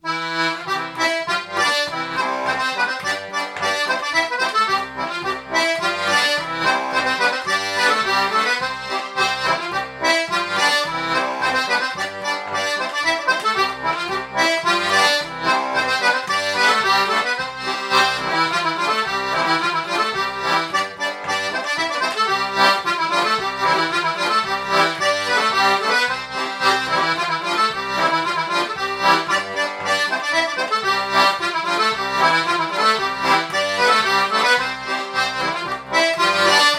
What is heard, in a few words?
Polka; Polka d'Elisabeth